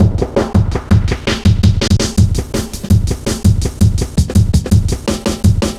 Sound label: Hard Edged, Drum Loops, 165.5; Zero-G Jungle Warfare 1, 2 & 3